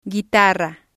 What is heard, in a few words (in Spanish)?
guitarra